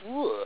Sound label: uhleghh